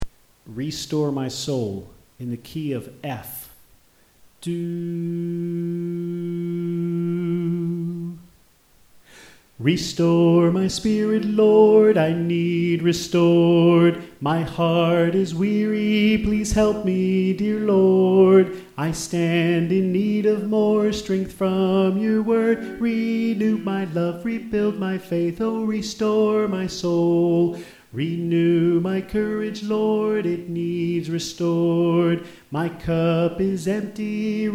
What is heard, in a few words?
Soprano